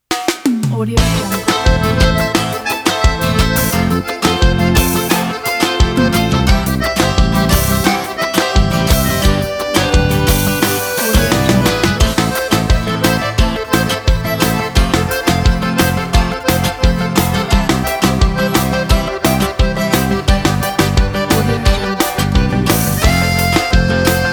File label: forrozin sertanejo